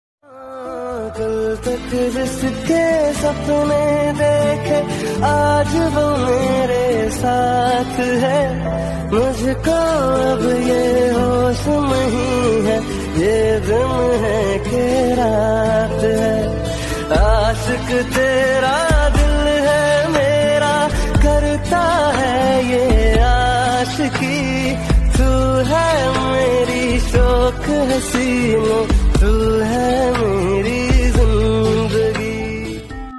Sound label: Hindi Love Song Ringtone